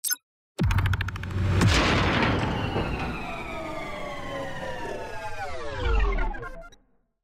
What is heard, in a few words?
Engine Die; Supralight